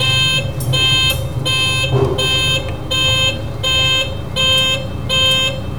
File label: Back buzzer; INDUSTRIAL